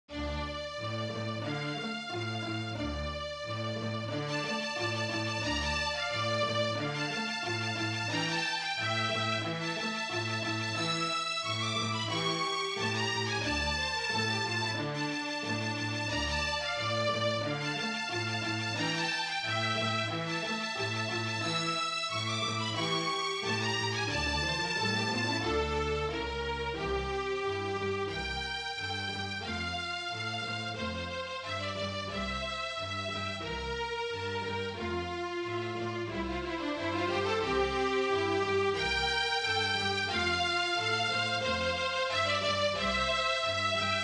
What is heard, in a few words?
tango